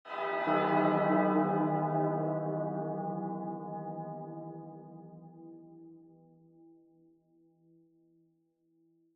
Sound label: void bell ring; Minecraft